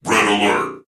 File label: alert; borg cube